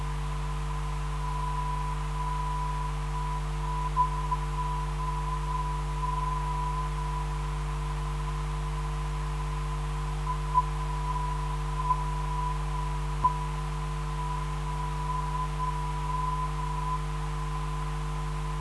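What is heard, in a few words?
ZP 294 04kHz, non-directional beacon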